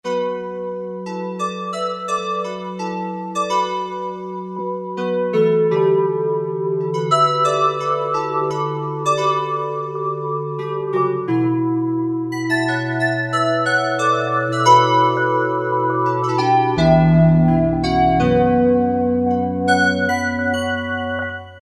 Asian Bells, Korg MSC-05S

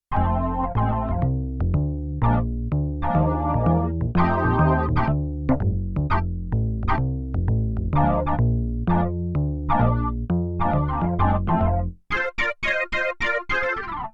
Hammond Bass Split; Yamaha TX16W; Big Band Performance

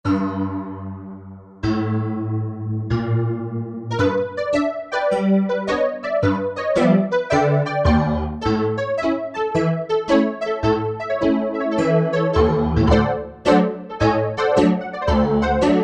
Korg MSC-1S Honky; Korg MSC-1S Variety

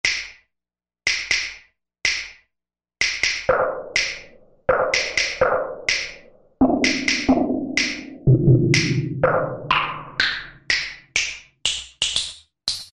Finger Snap, Korg M1; Factory preset examples

